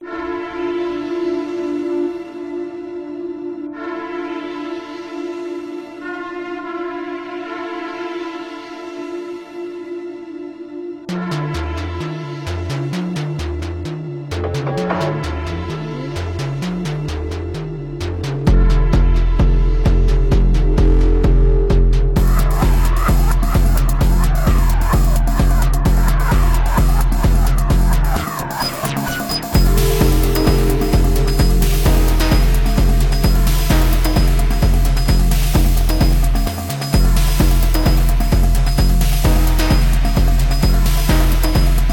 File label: Deep and Dark Techno